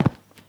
concrete, footsteps; Sourceforts2.0

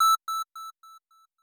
beep, weapons Beacon

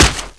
foot heavy, antlion guard; hl2

